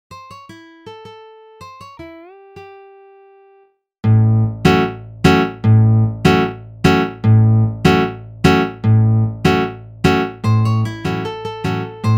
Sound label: Standard with Contrabass; small ensembles; quartets